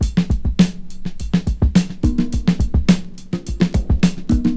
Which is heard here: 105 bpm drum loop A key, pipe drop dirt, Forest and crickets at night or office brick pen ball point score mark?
105 bpm drum loop A key